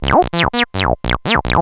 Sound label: saw